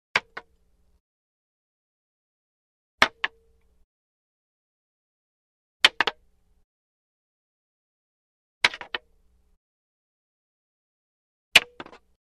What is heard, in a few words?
Wood Staff Hits Multiple Multiple Light Wood Staff; Hollywood Edge Foley Sound Library